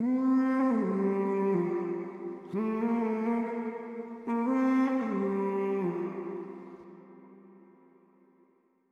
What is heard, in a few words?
Soul Vocals Gm (Wet) 140 BPM, Melodies; 99Sounds x Monosounds